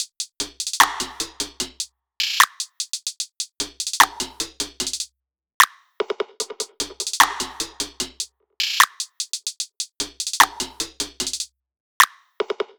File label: top loop 75; Pop; Drums; Loops; Slate; SD PASADENA Pop Sample Pack